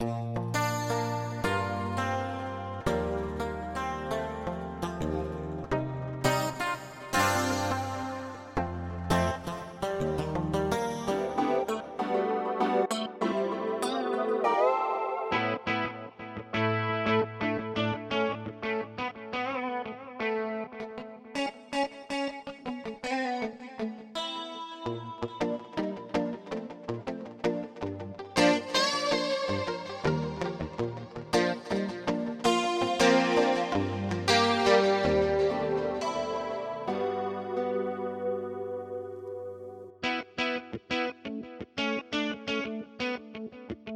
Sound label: Guitar